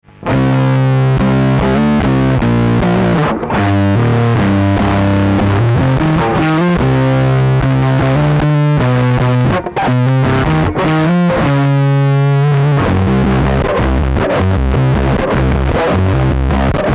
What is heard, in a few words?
bass; MeatHead DLX - low